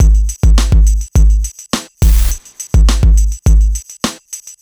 104 Pop Drums; Pop Rock; Moderate Tempo; Pro Tools Essential Loops; Digidesign

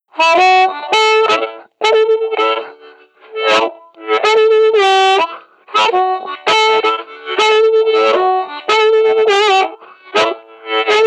Bls Harm, Blues; Digidesign; Pro Tools Essential Loops

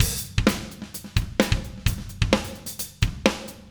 129 16Th Rock Drums Verse, Fast Tempo; Pop Rock; Digidesign; Pro Tools; Pro Tools Essential Loops

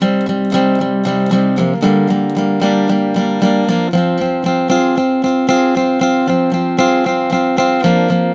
Pop Ac-Guitar 8th Cmaj; 115; Pop Rock; Acoustic Guitar; Pro Tools Essential Loops; Digidesign

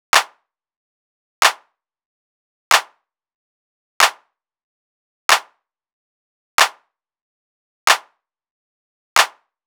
093 Hip Hop Clap, Percussion; Digidesign; Pro Tools; Pro Tools Essential Loops

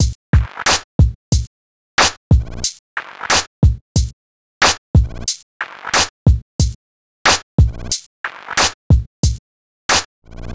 E Coast HipHop Drums; Digidesign; Pro Tools; Pro Tools Essential Loops; East Coast

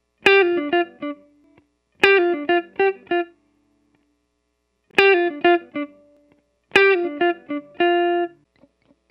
102 Pop Guitar S-Note D min, Electric; Pop Rock; Electric 100-160 bpm; Pro Tools Essential Loops; Digidesign

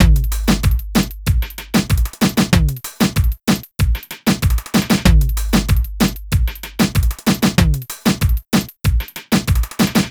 095 Latin HipHop Drums; Pro Tools Essential Loops; Digidesign

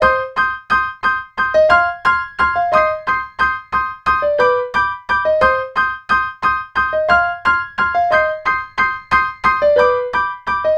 South HipHop Piano 8Th Cm; Digidesign; Pro Tools; Pro Tools Essential Demos; Essential Loop Demos; Demo Session - Hip Hop

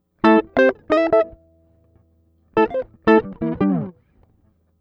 085 NuJazz Guitar Amaj, Jazz Guitar Loops; Pro Tools Essential Loops; Digidesign

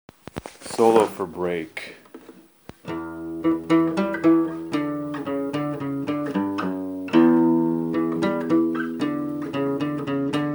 Break solo

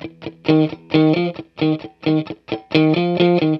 133 Pop Guitar S-Note F maj, Electric; Pop Rock; Electric 100-160 bpm; Pro Tools Essential Loops; Digidesign

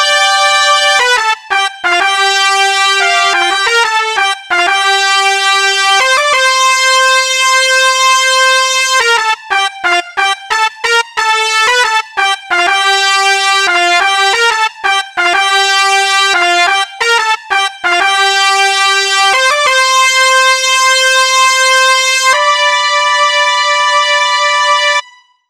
New Horns; Pro Tools Essential Demos; Digidesign

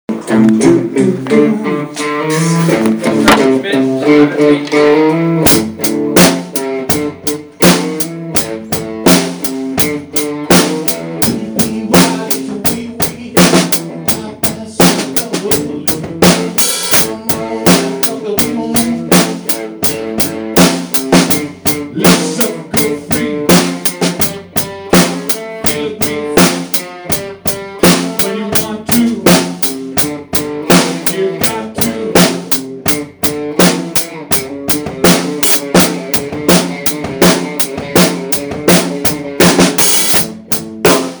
Funk riff

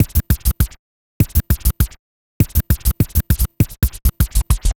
Vinyl Scratch Kick; Digidesign; Pro Tools; Pro Tools Essential Loops; Dance DJ

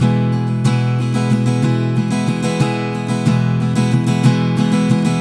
Pop Ac-Guitar Strum Emaj; Pop Rock; Acoustic Guitar; Pro Tools Essential Loops; Digidesign

